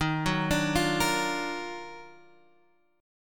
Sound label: D sharp Minor Major 9th D sharp down